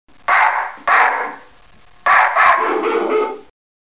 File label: barking; Broderbund Print Shop Multimedia Organizer Deluxe Edition